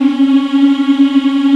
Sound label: VOX African; Roland LCDP11 Africa VOL-1